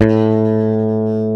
BASS A-1, BassGuitar; AKAI S6000 CD-ROM - Volume 1

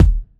Kick, Kickdrum; Equipped Music; Loungin House

